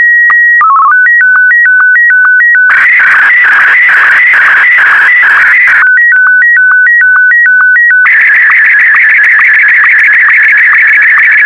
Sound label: sstv; VK4MSL